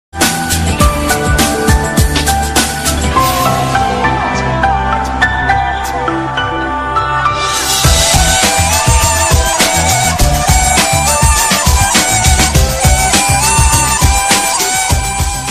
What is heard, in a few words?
tuning status